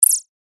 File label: lazer